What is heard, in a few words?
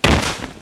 down; Monster girl quest Part1,2,3; Visual Novels